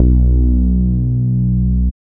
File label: BASS